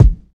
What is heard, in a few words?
short kickdrum a sharp key, Royality free kick single shot tuned to the A# note. Loudest frequency: 126Hz; kick drum samples